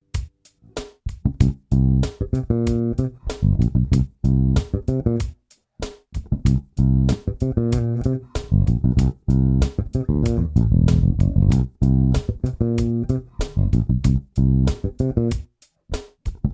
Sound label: Groove in C7